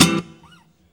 GUIT CHORDS; Best Service; World Colours

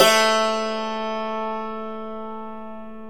DLCMR A2, Stereo Dulcimer

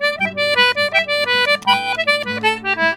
Celtic Flavours; USB Soundscan vol.42; AKAI

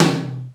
room hi tom; Crypton - DrumBase5000

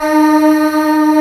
voice

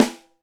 Snare, Alesis DM5 Drum Machines

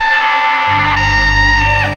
Siren; Zero-G Jungle Warfare 1, 2 & 3

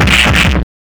MD 909 150, Hard & Loud Techno

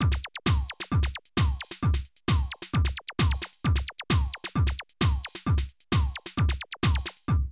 Drum loops, Euro techno

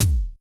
Kick, Yamaha RY20

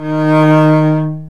STR, Cb Bowed, Cb1 mf vb; String Master Series

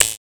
ZAP; Rarefaction; Poke in The Ear With A Sharp Stick

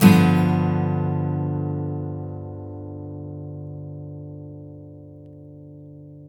Blues Guitar, BARI CHORDS; ILIO; Fretworks

